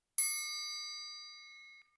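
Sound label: treugolnik, triangle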